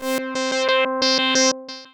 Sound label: Stop Short, analog synth rhythmic; Instruments; Synth Essentials